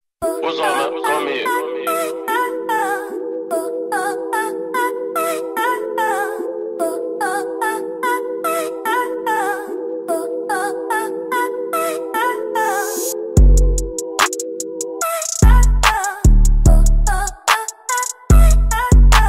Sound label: Instrumental